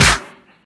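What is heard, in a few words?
wet snare single hit a key, tuned to the A note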